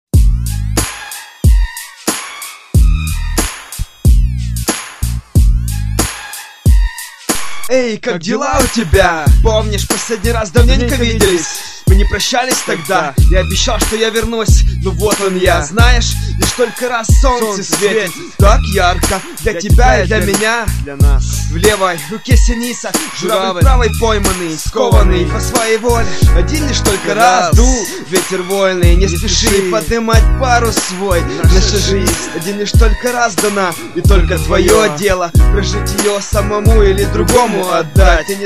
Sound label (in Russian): akkord